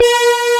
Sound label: ELEGANT PAD; AKAI S6000 CD-ROM - Volume 1; SAMPLE WAVE MIXING 1